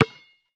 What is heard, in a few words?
Street Ball Bounce Concrete